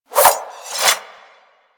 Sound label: Unequip Dual Daggers; Warframe; Weapons